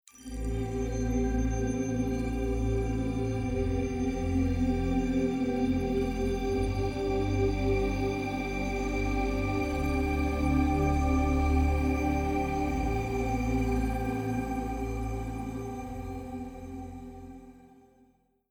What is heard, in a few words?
Future bass Chord Pad